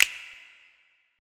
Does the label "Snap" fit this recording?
Yes